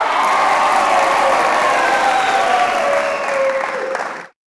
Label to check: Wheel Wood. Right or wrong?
Wrong